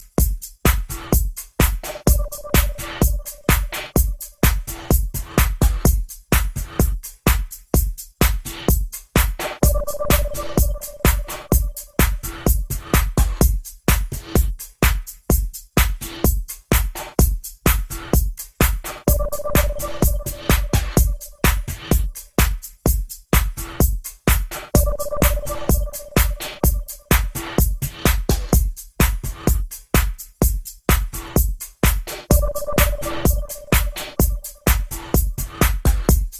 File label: Trouble Re Dub BEATS